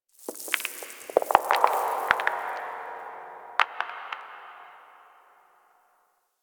Moon Dust Fall Small, Ambience; Warframe; Orokin

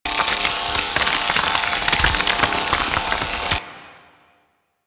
Mining Laser Spline Loop; Weapons; Eidolon Plains; Warframe

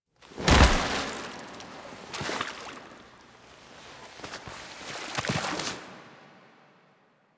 Foley Body Fall; Cinematics; Operator; Warframe; The War Within; Mountain Pass

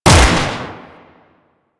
Lever Action Rifle Shot; Grineer

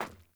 Sandals Run Metal, Footsteps; Characters; Player

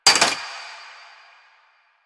Cafe Chair Short; Warframe; Ambience; Nineteen Ninety Nine

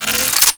Reload, Weapons; Strun Prime

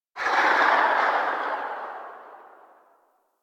Pistol Tail; Sapient

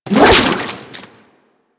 Kraken Tentacle Whoosh; Warframe; Pirate

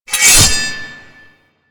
Machete Sheath; Warframe; Weapons; Melee; KahlMachete

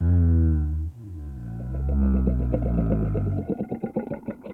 Landscape Mushroom Creak, Ambience

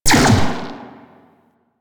Drone Fire; Super Drone Attack Osprey

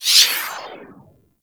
Skate Stride; Enemies; Skate Movement; Corpus Modular; Warframe; Corpus Modular Crewman

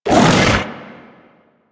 Stone Gaze Cast; Warframe; Brawler

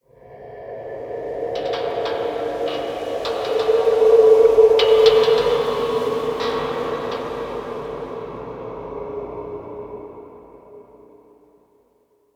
Park Wind; Ambience; Warframe; The New War; Quests; Zariman

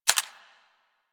SMG Mech; Weapons; Warframe; Tenno; Sundial

